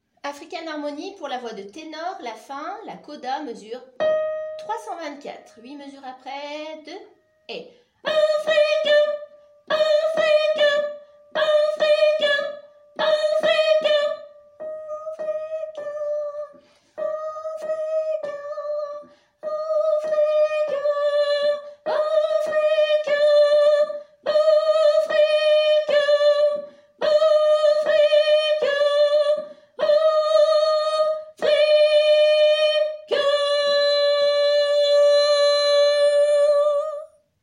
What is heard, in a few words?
African mes tenor